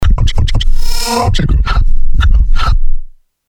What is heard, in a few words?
Granular